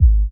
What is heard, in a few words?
BD; Best Service; Dance Mega Drums